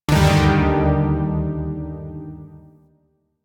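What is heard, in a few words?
Shawzin Minor Chord E; Zariman Shawzin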